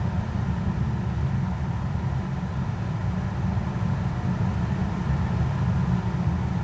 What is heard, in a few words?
Ventilation; zps; Ambient; Vents